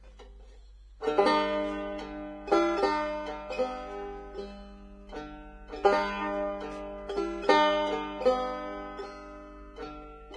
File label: Banjo Silent Night; Instruments; Zomboid; Lifestyle